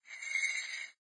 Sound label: Ting; Ki11er Clutter